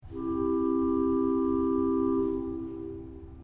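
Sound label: flautemaior 8 C, organ; srednja vas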